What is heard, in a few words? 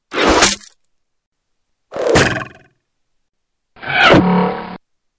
arrows